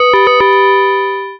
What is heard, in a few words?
Door; WMATA